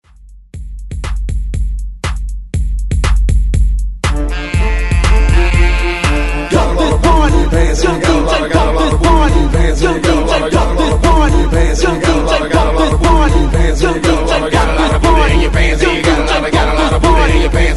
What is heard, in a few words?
121 Bpm; DJDOLLS